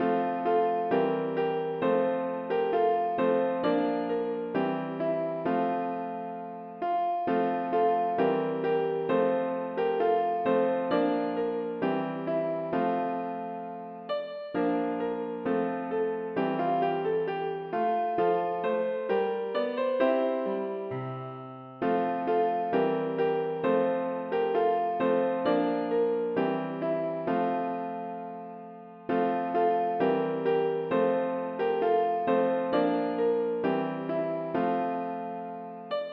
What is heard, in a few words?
piano